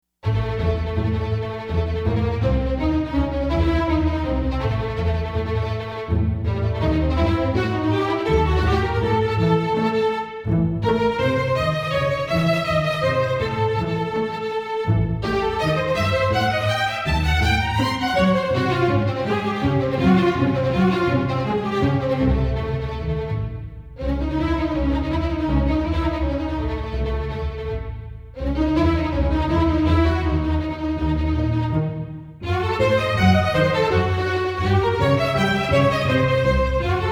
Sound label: Strings Etude